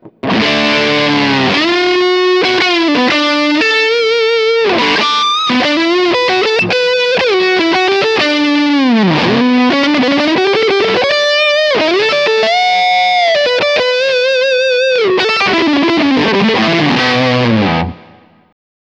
Baltic Blue Fuzz Tone 1 Uhr Sustain 3 Uhr Hals Singlecoil

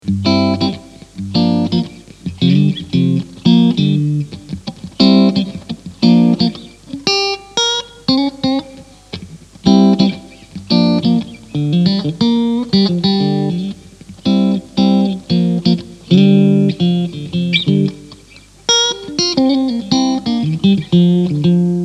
Blackstar clean Voice deaktiviert

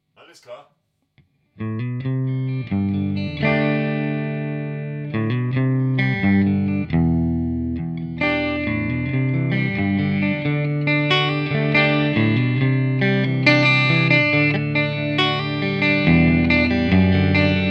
E Gitarre Clean

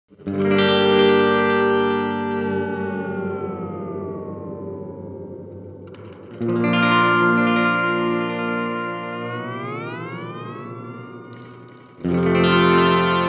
Boss RE-202 Twist u. Warp

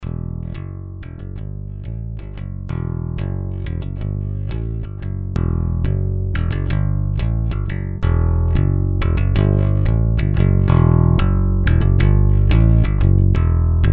Diverse EQs Fender nur Deep Kanal; GED 2112

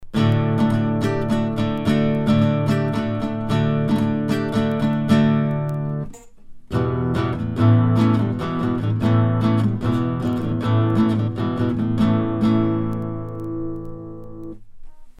Sontronics STC 3x Kugel Gitarre

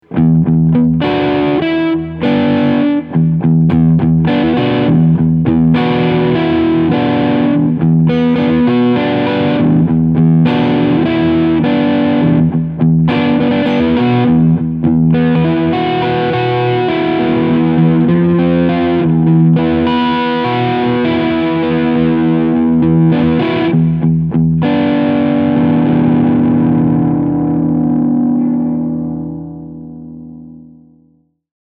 EMG Crunchsound beide PUs; Harley Benton Fusion III EMG HT Roasted SBK

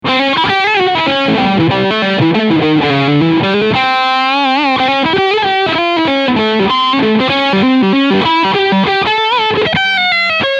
PRS SE Starla Leadsound Steg Pickup

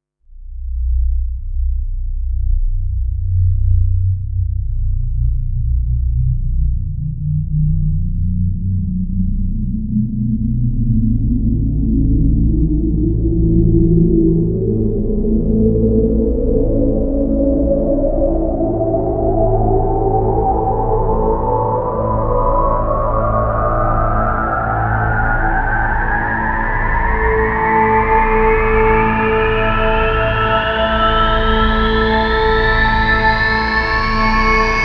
Rise kurz Detune

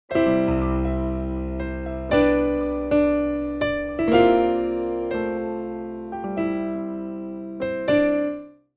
Ballade; WIDI

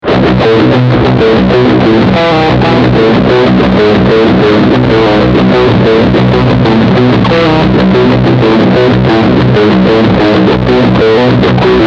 Low B Riff Steghumbucker

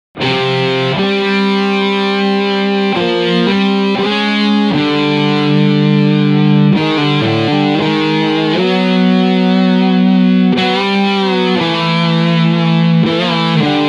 Beide Voices 5 Overdrive

